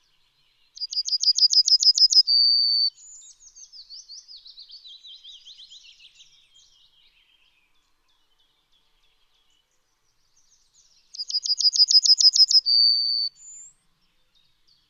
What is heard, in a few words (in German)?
Goldammer Emberiza citrinella citrinella